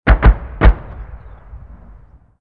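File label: explosion medium; amb elements; modern warfare 2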